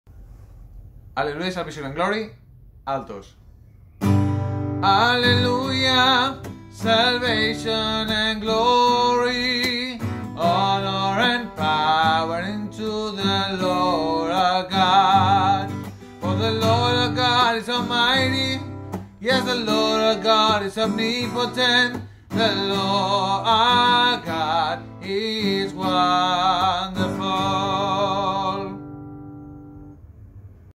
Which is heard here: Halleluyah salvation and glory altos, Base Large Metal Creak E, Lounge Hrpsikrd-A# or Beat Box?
Halleluyah salvation and glory altos